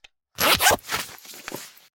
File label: zippers and packing